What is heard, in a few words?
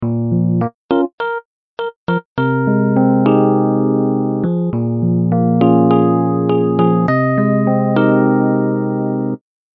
Sweet Rhodes